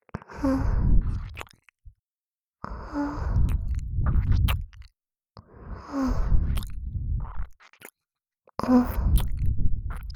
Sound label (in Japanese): 耳キス (交互), ASMR